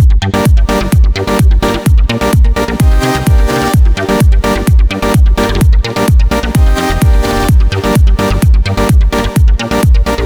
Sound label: groove